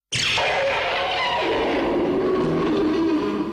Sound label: roar; Rodans